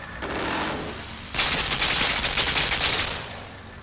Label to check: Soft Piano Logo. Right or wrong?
Wrong